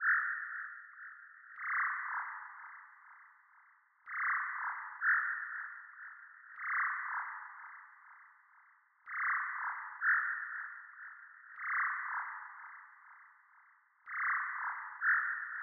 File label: jungle bird